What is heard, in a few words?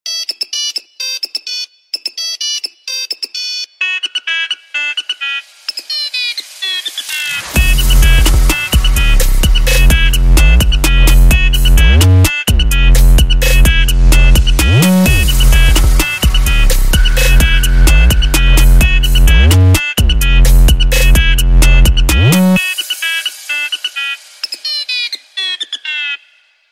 Nokia 3310 Trap Remix Ringtone; ringtones